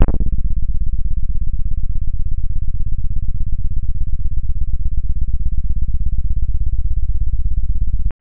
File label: BASS